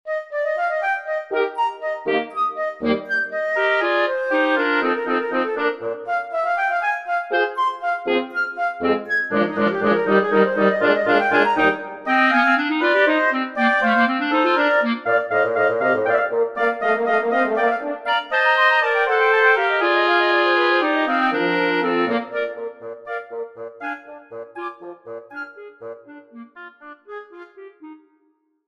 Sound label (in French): quatuor; haydn joseph